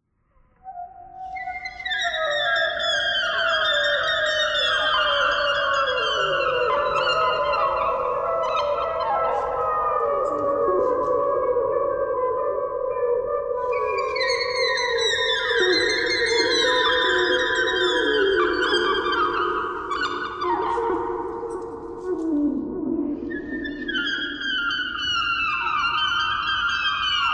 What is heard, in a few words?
clarinet clarinet thread noise; clarinet geese